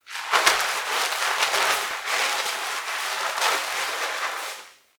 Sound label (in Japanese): 体をあらう